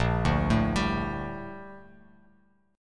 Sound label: Bb Major 13th Bb Bass Standard; arpeggiated; JGuitar